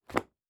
Book; Survival Game Sounds - Lite Edition; Objects and Items

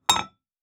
Glass; Survival Game Sounds - Lite Edition

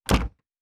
Door Close; Survival Game Sounds - Lite Edition

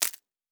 Wood

